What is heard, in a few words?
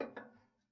Ping, Kitchen Toaster